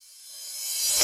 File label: Cymbals Reverse Crash; Vengeance Samples